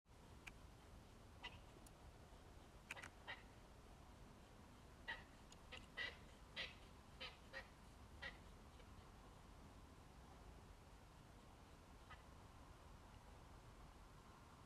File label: Hedgehogs